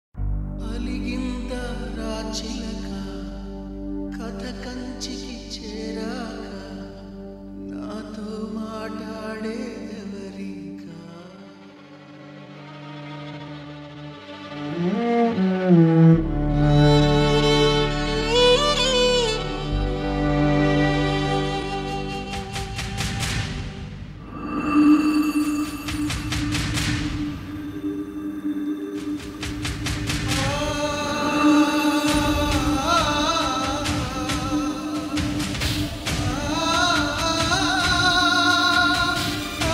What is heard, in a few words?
interval sad bgm; Simhadri